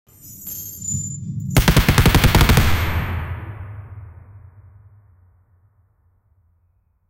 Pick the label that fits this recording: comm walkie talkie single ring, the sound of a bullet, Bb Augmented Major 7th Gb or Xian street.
the sound of a bullet